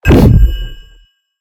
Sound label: fire; smite; atlas